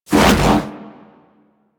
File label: Hoverboard Jump Spin Fast; Warframe; Vehicles; Venus; anim sounds